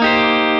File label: clean Arpeggio down Asus4